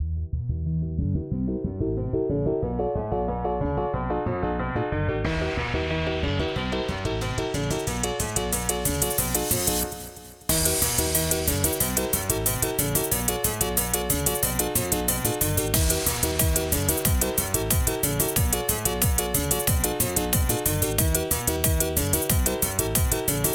Instrumental; Application; Friday Night Funkin' Vs Job Application

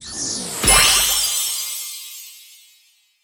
Rookie league Clear

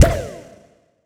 Enemy Onion Active Headshot; Disney Star Smash; Android, iOS, Mobile

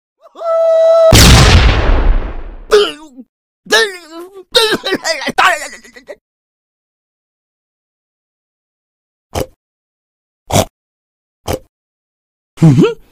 pig Hurt

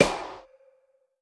Dynamite Pop; StyxMech

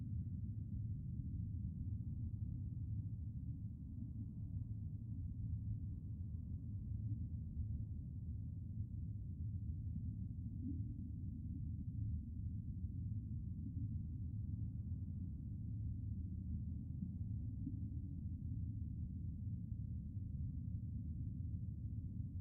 underwater main

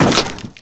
Hit Wood; Test Drive Off-Road 3

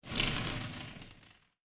Creak; lethal company

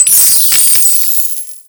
Coin Spawn Large

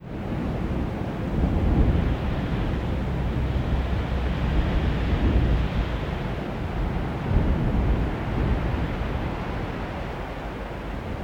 Namibia Wind

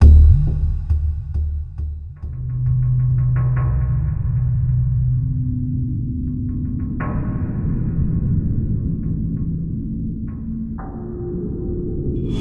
Amb Indoor; The Hobbit; PS2

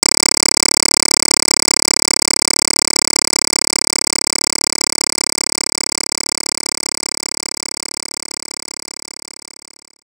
Result Count Loop

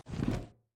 Jump In Seat; Cruiser; Lethal Company

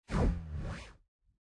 Board Upgrade; Subway Surfers City; Android, iOS

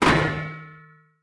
Drop Metal Object; lethal company

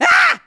Piano -

